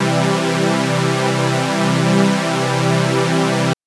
Synth SawPad maj c2; TrashLibrary

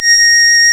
REED A#; Big Fish Audio; Synclav FM

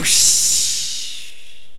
VOX CRASH; RAP CITY 1

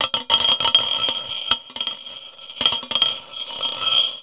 DROPPING; ENVIRN-AMB

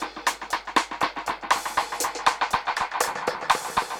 Dub Drums B Echo Kit 120bpm; musicradar

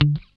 GUI D3, E-GUI MUTED; Sound & Vision; Gigapack I CD 2

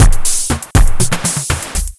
Beat, Drums, Eclectic Electronica

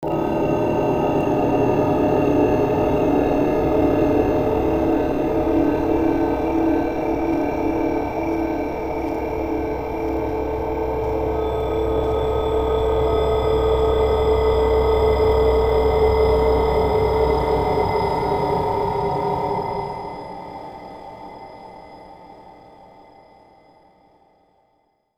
ambient; Sonic Foundry (Sony Creative Software); Ugly Remnants VOL-2; UR 2 FX (Long)

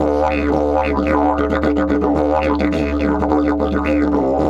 108, Didgeridoo

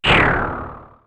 Swooosh, synth fx; StarWars; X-Static Goldmine

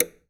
POWER ON; AKAI Anime Sound FX

